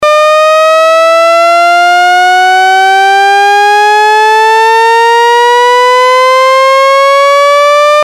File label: rising octave